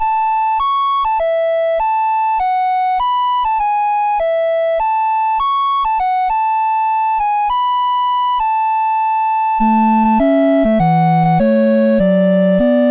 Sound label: London Street Cries, MOS6581R2